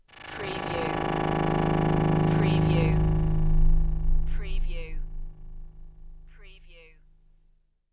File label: Strange Emissions